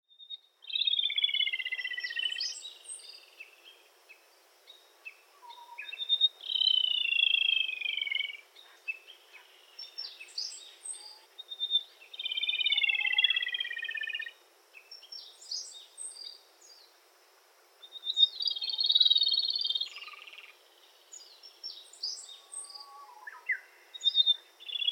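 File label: Rufous-tailed Robin; nagasaki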